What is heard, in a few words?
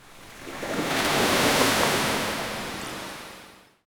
ocean